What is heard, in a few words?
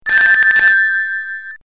ring loss; sonic